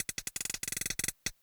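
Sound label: loop intro, 167 BPM; Ueberschall; Drum & Bass Resonance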